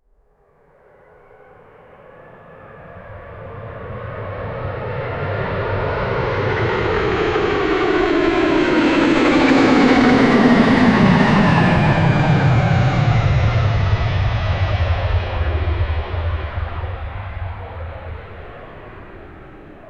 dh9 plane taking off; aviation

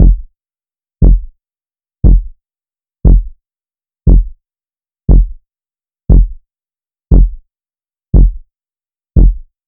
short quiet heartbeat bur

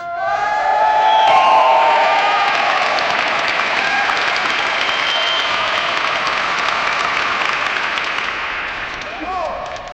arena crowd swell rising

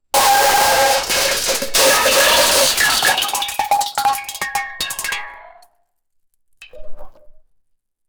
fizzy liquid bursts from

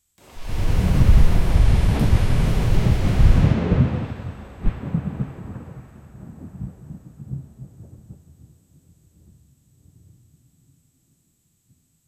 distant lightning cosmic

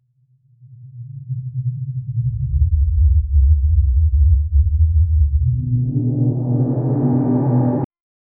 soft squish mud footprint

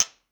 plastic objects hit

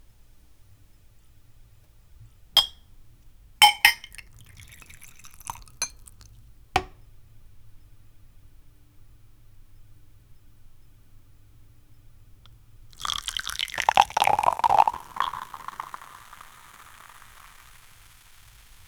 the sound of opening a, drink

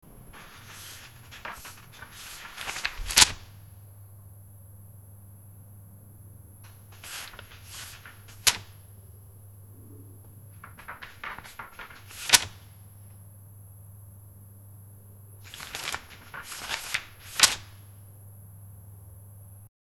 soviet totalitarian atmos; history

